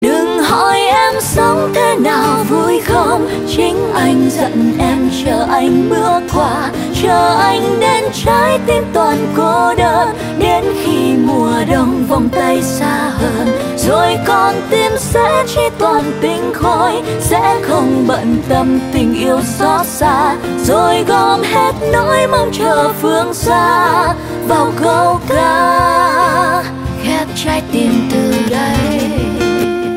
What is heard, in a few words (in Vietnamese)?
nhac chuong